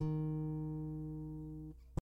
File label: string4 d sharp2